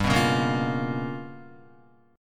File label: G 7th Sharp 9th Flat 5th, Guitar; G7#9b5 strummed; JGuitar